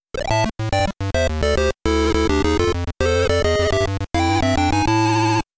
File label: TITLE (PC-8001); New City Hero